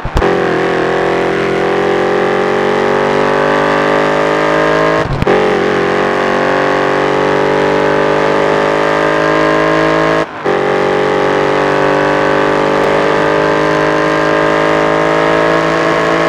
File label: fourth cruise, vehicles; charger 2015